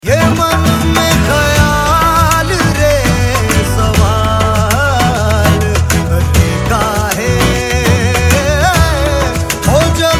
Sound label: ringtone